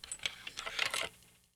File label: keys pickup